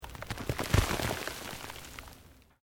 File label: Earth Loose Move Short